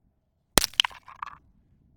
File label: frozen farmland ice crack hammer pull short; eneas mentzel; Sonniss GDC